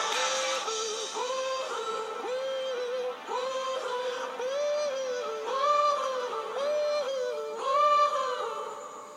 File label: wohoo